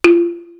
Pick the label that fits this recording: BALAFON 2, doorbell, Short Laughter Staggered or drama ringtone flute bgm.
BALAFON 2